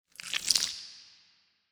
small leg pouch suck up; biogenesis

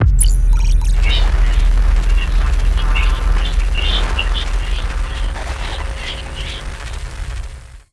mysterious signal; Stellaris; nemesis

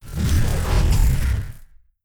hive minions moving out; Stellaris; guardians

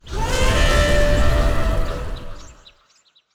savannah planet, ambient; Stellaris

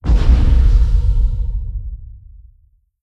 distance stellarite attack plasma; Stellaris; guardians

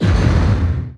distance tachyon lance hit; weapons; Stellaris

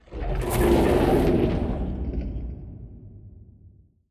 void worms troika attack; Stellaris; grand archive; ships

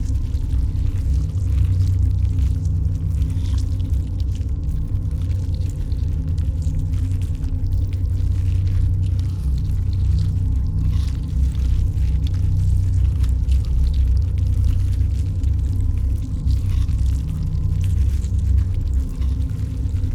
ant ship mining station idle; biogenesis

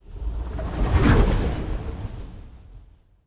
construction ship move; aquatics

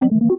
ui randomizer click; Stellaris

